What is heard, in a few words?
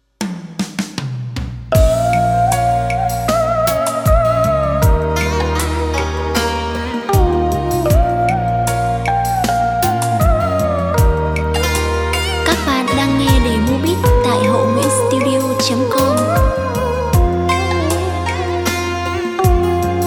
beat, playback